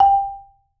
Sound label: soft G4, Marimba; Sampleheads; Dave Samuels Marimba & Vibes